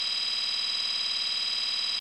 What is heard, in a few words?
Instrument